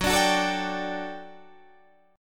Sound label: G Major 7th Suspended 2nd F sharp